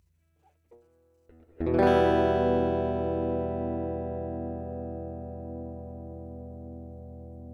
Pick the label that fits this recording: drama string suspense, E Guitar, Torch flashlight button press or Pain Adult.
E Guitar